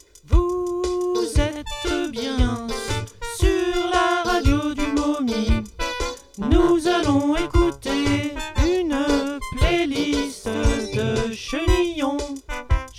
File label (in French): chenillon jingle